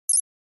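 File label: Flash Beep Electronic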